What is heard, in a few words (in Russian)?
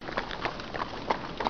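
sound of a horse walking on a dirt road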